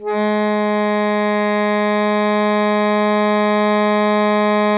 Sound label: SOPRANO G#2, Saxophones; Greytsounds; Philip Wolfe David Crozier's Saxophones